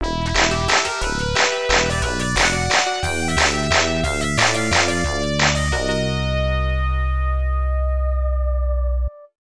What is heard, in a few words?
Game Over Arrangement; Fantasy Zone; X68000